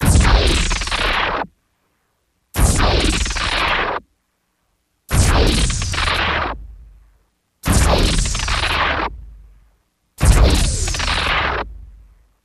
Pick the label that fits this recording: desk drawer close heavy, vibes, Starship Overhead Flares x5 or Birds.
Starship Overhead Flares x5